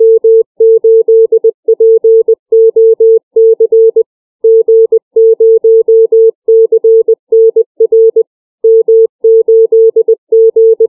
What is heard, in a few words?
callsign, CW